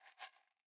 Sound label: shaker single hit; Raw Perc One Shots; Slate; SD SEISMIC Drum Sample Pack